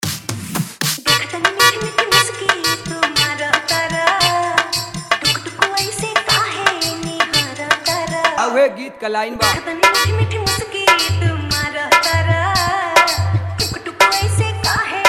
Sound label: Bhojpuri Dj Remix Songs Vibration Bass Mix